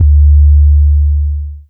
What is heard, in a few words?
Bass